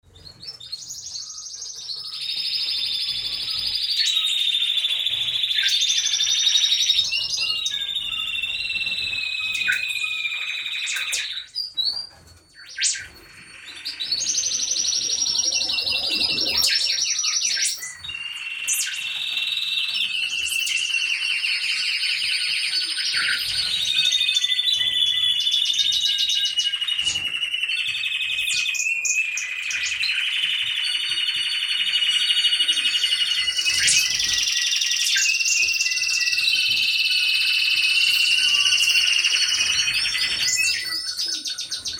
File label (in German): Vogelvoliere